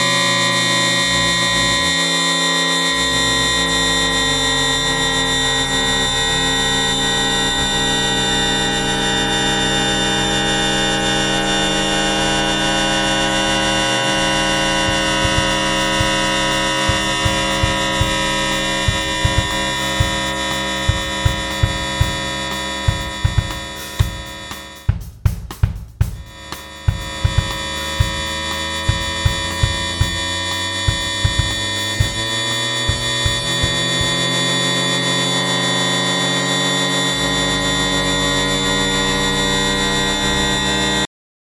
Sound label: Rossum Electro Music Panharmonium Drum Drone